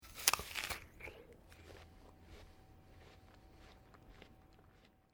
MALE EATING APPLE BITE CHEW; Male Vocal sounds; Human

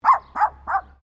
Animal Dog Poodle Miniature Bark Sequence; Various domestic dogs; Animals

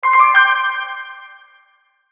Logo Short Melody Reverb; Sonic Logo; Blastwave

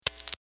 sparks, Electric Spark; Single Spark, From Guitar Cable; Zaps; Electronics

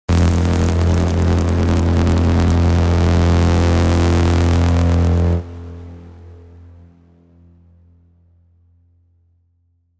Throbbing electric voltage; High Voltage; Electrical (Sound Effects:Sound Design)

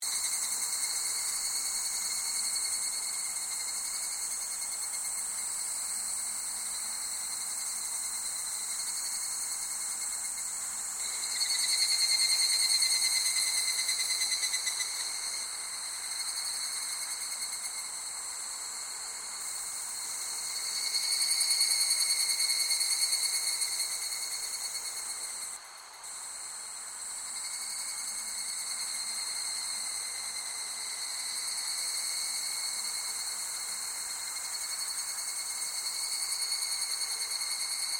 Ambience Japanese Summer Insects; Ambiences generated from original source material constructed in FMOD; Environmental Sound Effects

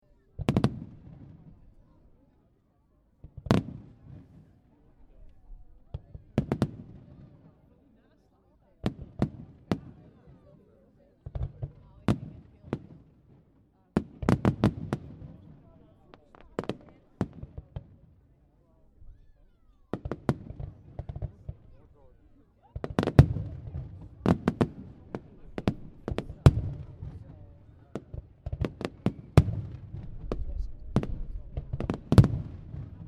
Fireworks Display Grand Finale At End; Various fireworks / rockets / flares bang and sizzle; Fourth Of July Sound Effects

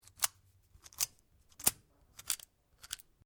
RIFLE CZ 452 VARMINT 22 CALIBRE MAGAZINE UNLOAD; A selection of domesttic firearms; Rifles

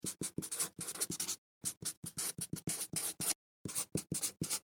Office Marker Write On Cardboard Long; Writing (Sound Effects:Human)

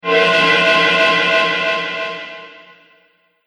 Cymbal Resonant Whoosh

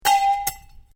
METAL PIPE FALL; Sound Effects:Materials; Foundation3

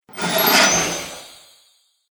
Firework Magic Hit

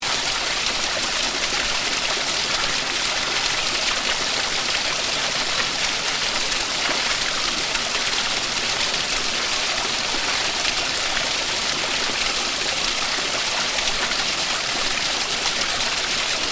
Stream loop, Stream: water running, loop; Nature; Stuart Duffield

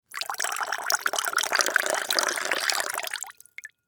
Foley Wine Glass Fill Drink Liquid; Kitchen; Household

